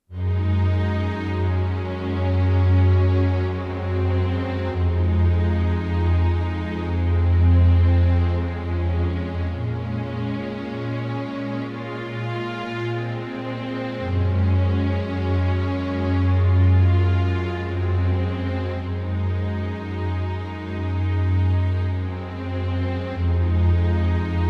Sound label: strings melancholy; Muzyka Ilustracyjna